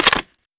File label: rifle clip out; rifle desert, gunother; weapons